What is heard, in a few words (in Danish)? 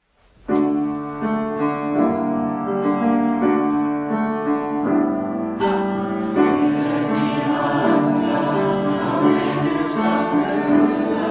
stille mirakler alt bas